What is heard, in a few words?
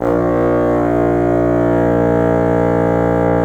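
wind bassoon; LA Composer vol. 3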